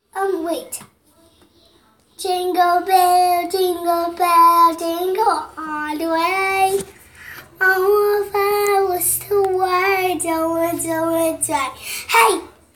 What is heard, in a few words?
Jingle Bells Sung